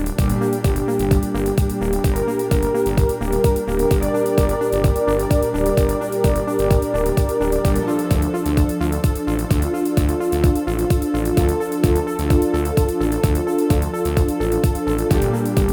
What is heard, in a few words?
New Age Techno